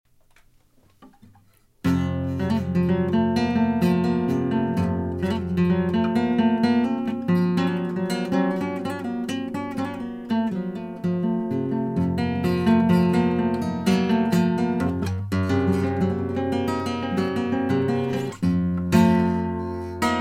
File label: Zambra, classical guitar